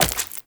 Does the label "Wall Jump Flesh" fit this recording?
Yes